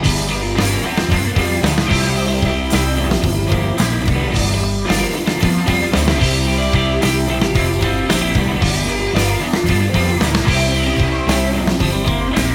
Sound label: Console Emulation Vintage Cross No FAT; Sonimus